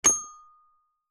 leisure toy musical xylophone key plastic press single note